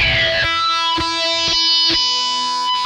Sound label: HARMONIX, Funk Guitar; 90 s Sample CDs; Zero G